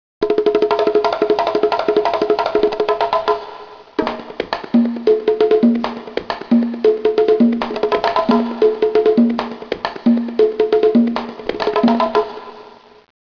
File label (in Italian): bongos